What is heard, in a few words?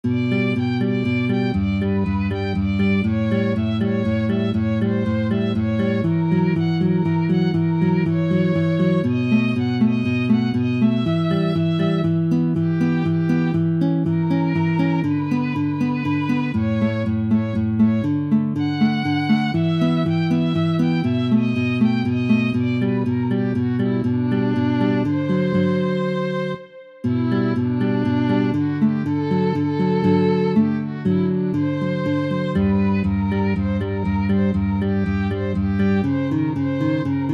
salsa